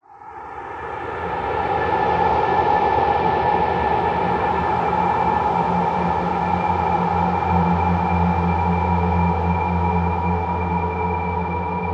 science fiction atmosphere cold wind whining drone weird; zapsplat